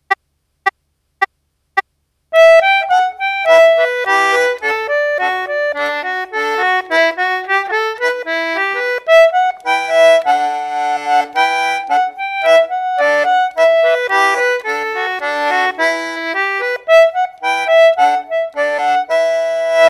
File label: Reel Slow; The Iron Bridge Reel B